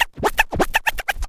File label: scratch fills; SCRACTH FILLS; Megabass Remix! 2